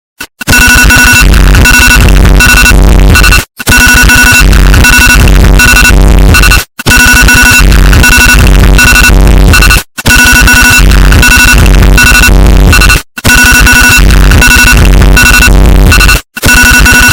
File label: iPhone Alarm EARRAPE